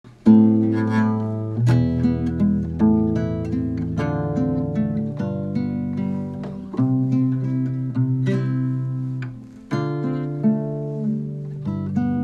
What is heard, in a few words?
A fleeting meditation